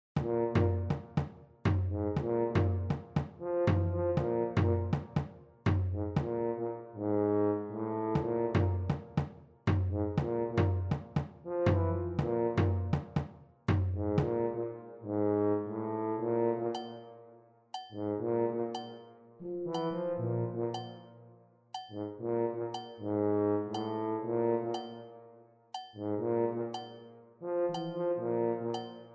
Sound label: tuba